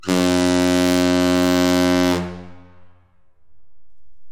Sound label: Swanson KM85, horn